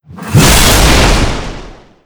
Heavy orc Skill; Seer; GamigoNA

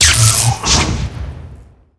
Shock Flower Wide; Seer; GamigoNA

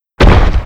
Big Yeti Run; Seer; GamigoNA

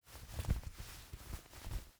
Ice Deer Idle

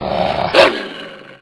Psykendog atk; Seer; GamigoNA

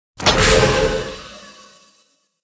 Door Lock Main Open; Fiesta V.3; Nyx

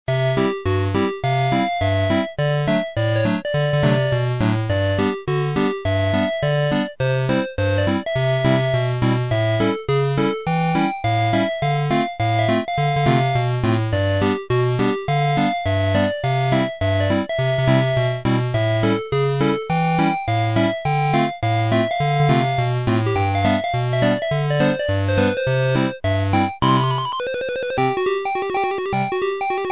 Dice Music; BGM; Gamigo

